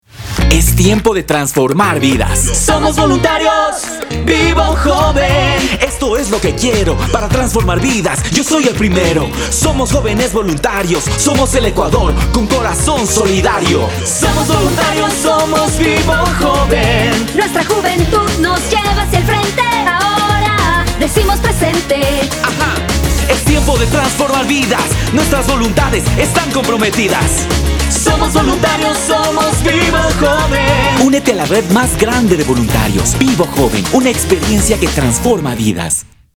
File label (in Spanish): JINGLE; MIES VIVO JOVEN